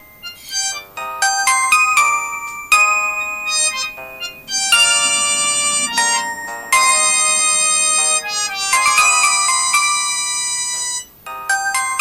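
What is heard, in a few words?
samsung old but funny melody; samsung-starenkaya-no-prikolnaya-melodiya-samsung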